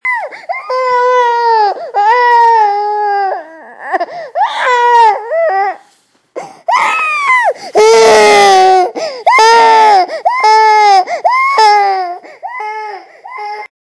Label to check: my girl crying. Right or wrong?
Right